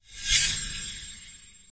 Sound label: Sfx Boost; Friday the 13th: Killer Puzzle